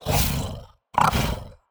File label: Victory Vo; Shadow Minion1